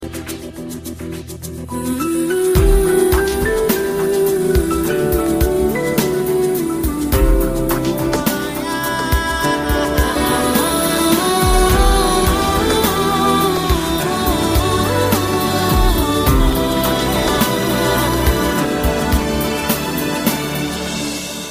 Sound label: Very Heart Touching Sad Instrumental Ringtone